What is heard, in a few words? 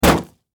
Car Crash; teststrecke-game